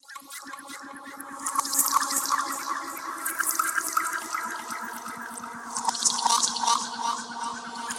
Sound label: Alien Sound Fx